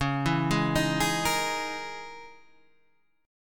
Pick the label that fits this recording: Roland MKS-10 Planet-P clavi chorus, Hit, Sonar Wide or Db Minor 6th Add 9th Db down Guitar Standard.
Db Minor 6th Add 9th Db down Guitar Standard